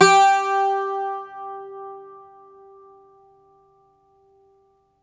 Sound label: IRISH BAZOUKI, Plucked Strings; E-MU Producer Series Vol. 7 – Old World Instruments